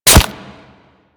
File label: Melee Impact Flesh; Warframe; Kubrow